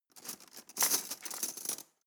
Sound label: cutlery teaspoon drawer handle